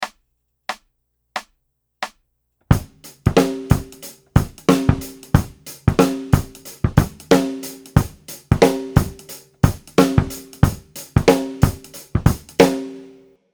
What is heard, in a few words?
Hip Hop Feel; Daniel Glass